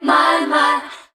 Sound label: "my my", sung; g-rated swears; Voice; expletives